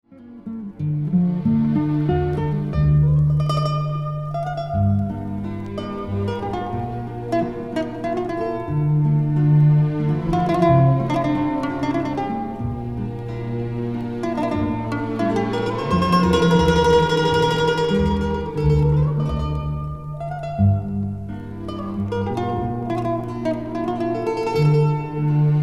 bandolim

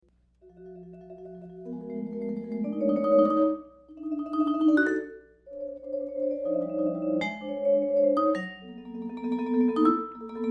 vibrafone

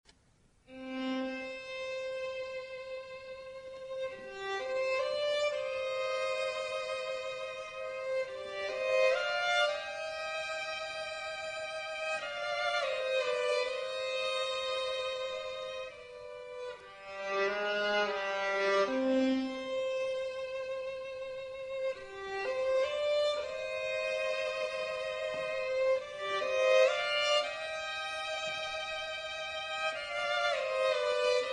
violino; instrumentos

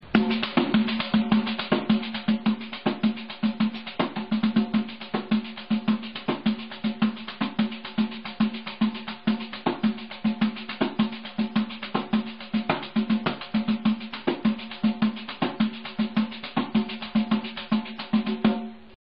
pandeiro; instrumentos

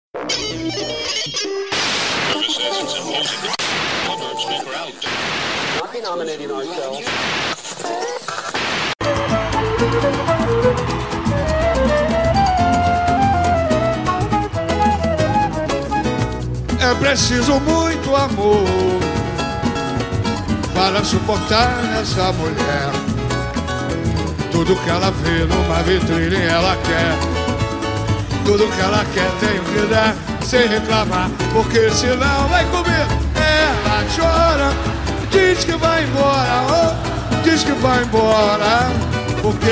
trecho samba; PGTIAE 2011 - Ritmos Musicais

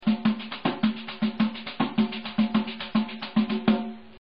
pandeiro mini; instrumentos

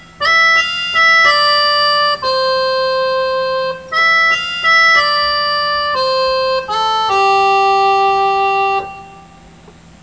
gaita; Instrumentos

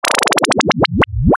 erro; Adivinhações - CPII

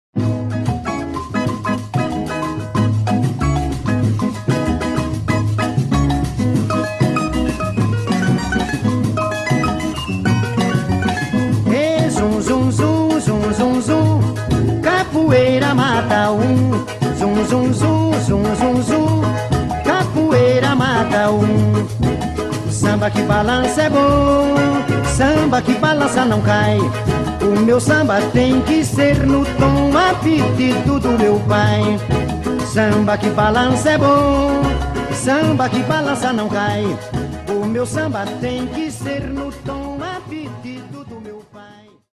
pandeiro

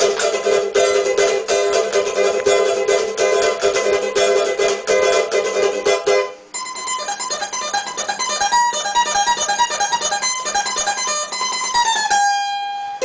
cavaquinho; Instrumentos

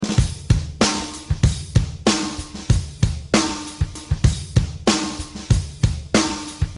bateria; instrumentos

